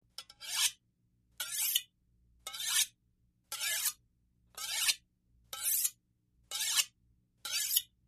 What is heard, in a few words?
Sharp Carv Knife Slw, utensils; Sharpening carving knife,slow